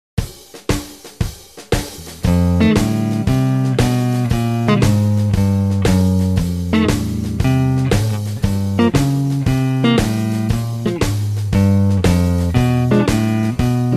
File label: Rhythm Guitar